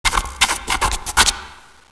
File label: quill; CYPHER